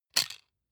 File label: plastic frame small hit rattle